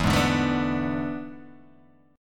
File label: E 11th, Guitar, Open G